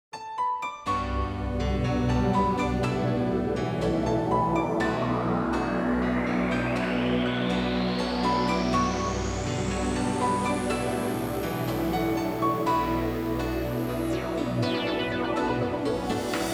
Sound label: (off vocal version); AIR Original Soundtrack